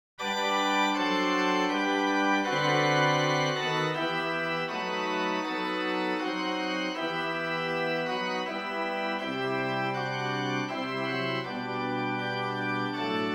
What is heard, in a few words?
Andante Voluntary for organ; Merrill; piano in the 1800s